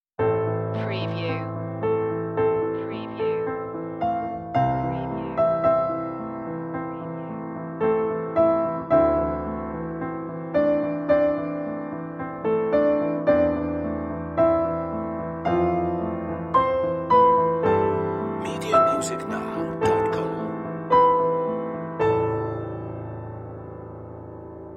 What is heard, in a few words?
emotional piano cue